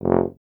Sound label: BRS TUBA, Solo Instruments; Miroslav Vitous; Solo Instruments VOL-2